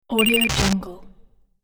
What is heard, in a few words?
tone sensor to glitch